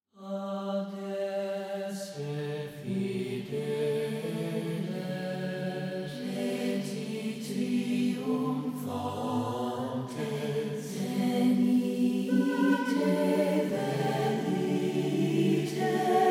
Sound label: Adeste Fideles O Come All Ye Faithful A cappella